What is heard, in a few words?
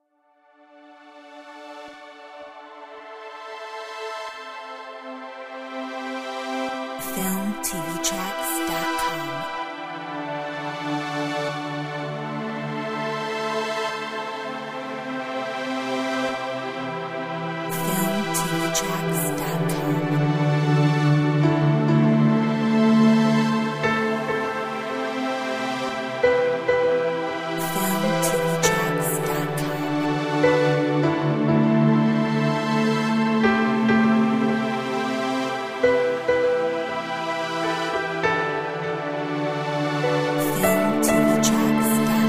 River of strings